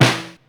Snare, Ensoniq ASR-X Pro

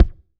KICK; Kick 1

